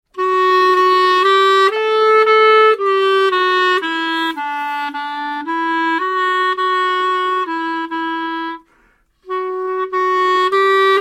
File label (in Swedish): Klarinett; 16 bit